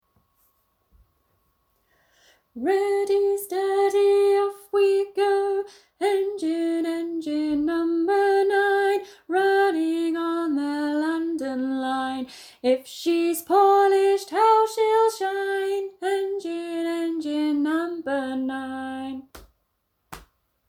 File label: engine engine song; In Harmony teacher resources; EYFS and Key Stage 1